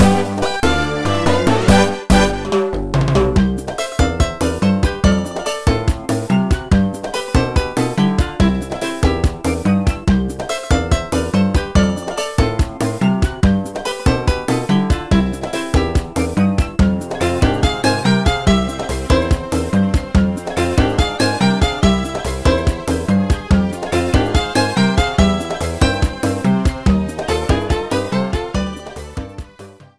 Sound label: Ending; SMB3